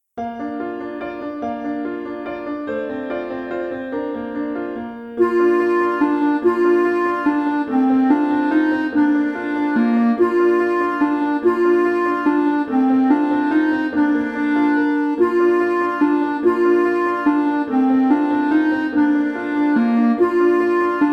minuet

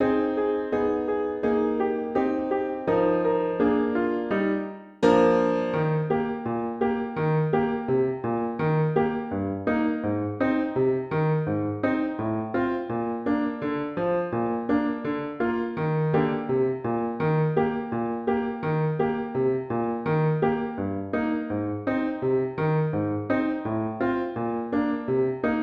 jingle bells piano

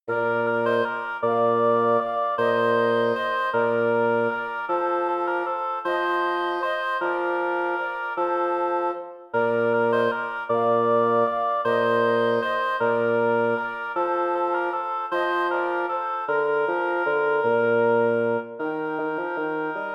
galliard

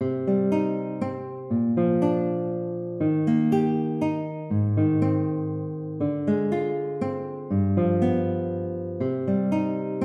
we three kings clarinet guitar

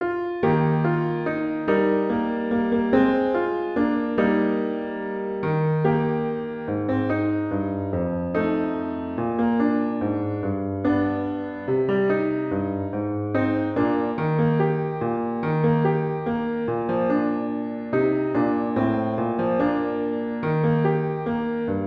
we wish you a merry christmas piano accompaniment

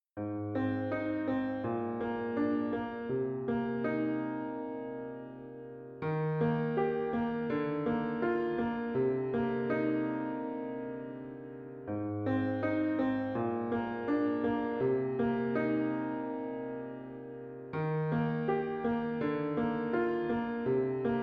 cantabile accompaniment; elementary solos accompaniments

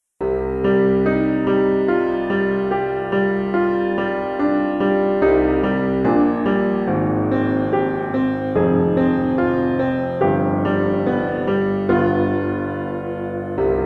adagio accompaniment; elementary solos accompaniments

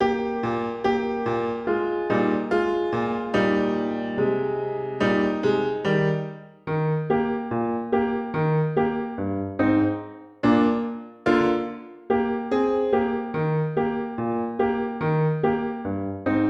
piano comp

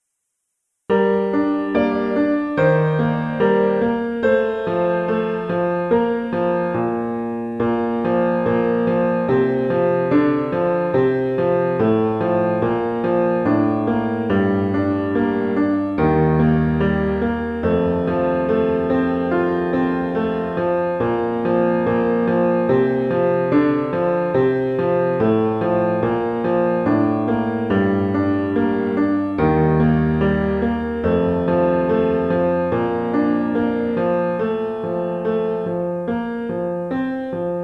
nocturne accompaniment; elementary solos accompaniments

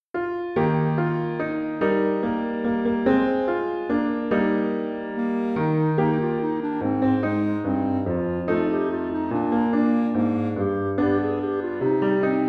we wish you a merry christmas piano clarinet

